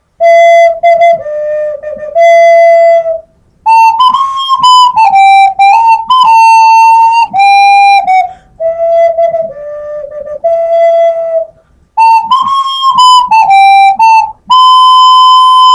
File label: ocarina